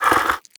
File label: breath in, headcrab black; creatures